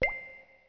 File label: raindrop, phone tones; Samsung; GT-B7320 Omnia PRO ATT